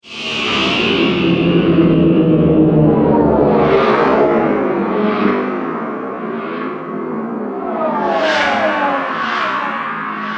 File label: Space Car Soundscape, drone sound effects; sound design